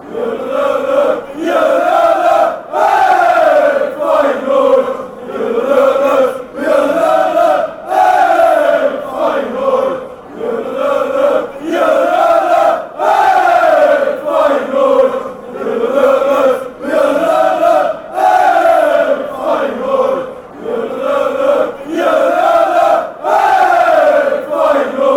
hey fan chants; feyenoord

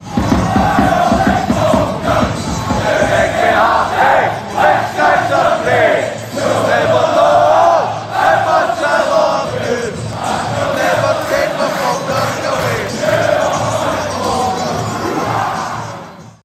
chant, fan chants; falkirk fc; you are my falkirk

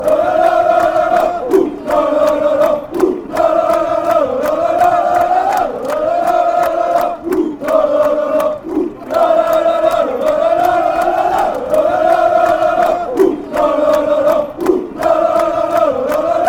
lalalalala ooo

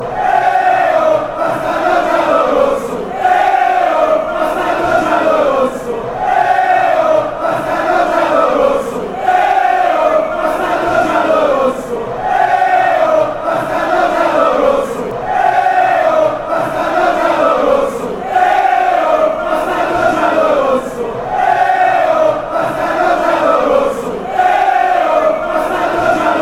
yellow red, fan chants

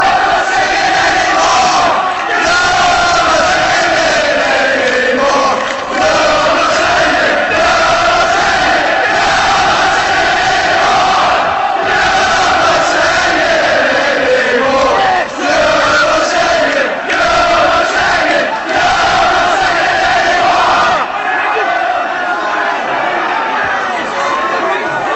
your not singing anymore

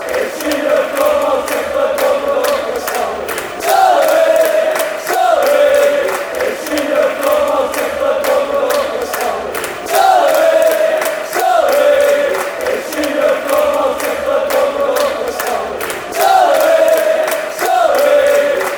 celery celery, chants

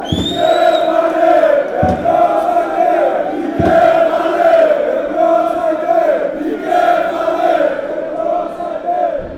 aek athens chant

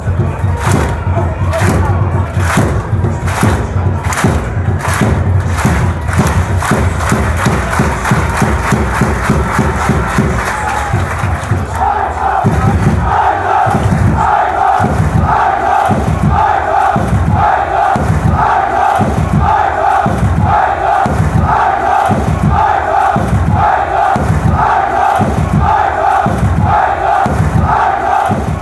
drums, fanchants; eintracht

